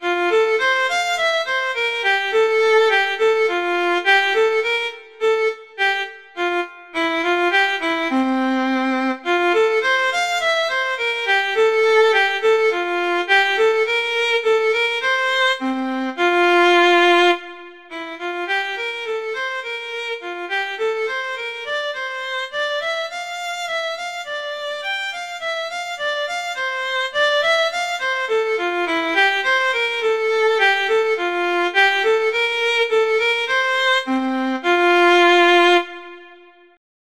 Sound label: Melody, Violin; Fitzpatrick; Violin Melodies